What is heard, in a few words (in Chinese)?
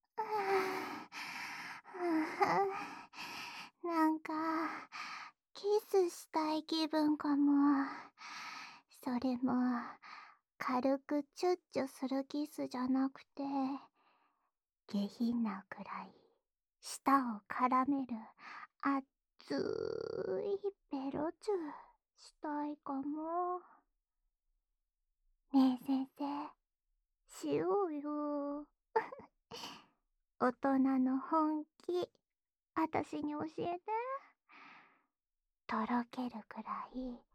舌吻循环; 卿卿我我用